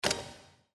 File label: reel spin stop, slots; Outdoor America